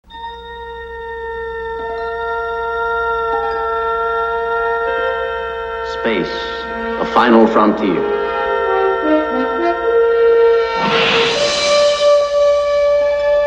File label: Theme Ringtone